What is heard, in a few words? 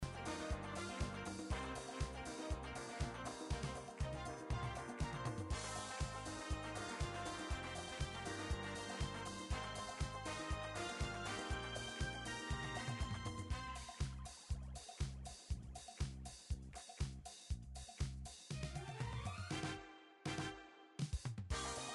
standard menu; Subway Surfers